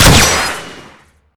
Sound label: blade shoot; garrysmod